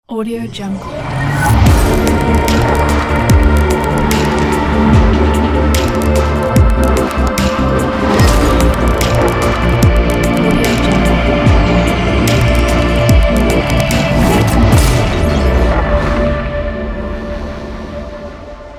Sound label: abstract futuristic logo